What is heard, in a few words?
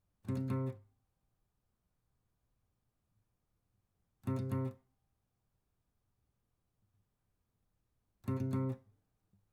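acoustic pattern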